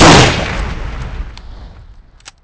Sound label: enemy sniper; weapons; Soldier Of Fortune II Double Helix; XBOX